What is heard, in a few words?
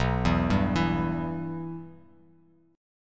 B Suspended 4th Sharp 5th B Bass Standard; Bsus4#5 arpeggiated; JGuitar